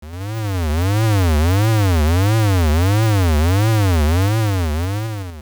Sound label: Eagles Tower Rotate; Zelda